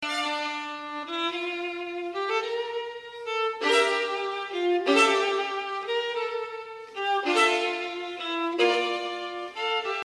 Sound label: violin